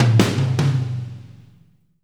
Fills 75; Zero-G; Monster Beats

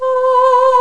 VOICE & BELL

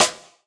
Snare, jungle breaks drum sounds

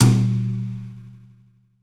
New Brush Tom, Roland BK-5

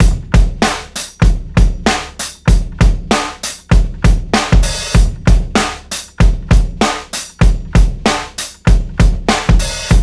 Kit 97, DRUMS LOOPS; BASEMENT DRUMS VOL 2

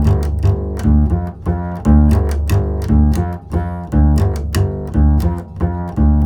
CHASE G, Bass; Spectrasonics; Bass Legends

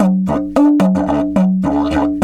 108, DIDGE 108BPM

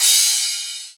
Crash, Cymbals

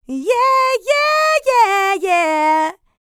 licks, vocal, dance; ILIO; Vocal Planet

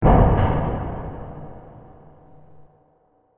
big clank

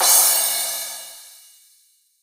CRASH, JD DRUMS KIT; EdgeSounds; Drum Mashines VOL-1

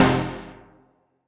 stab topper, stabs

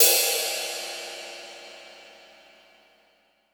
brush; Northstar - New Gold VOL-2; 30S BRUSH

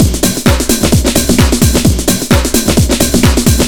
DRM, House, LOOPS 130; Best Service ProSamples vol.10 - House; AKAI

